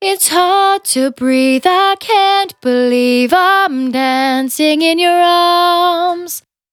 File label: Finally 70bpm C, Velvet Vocal; Zero-G